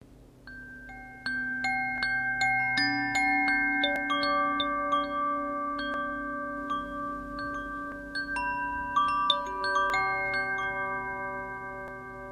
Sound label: Koshi chime; Ignis